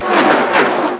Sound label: vroom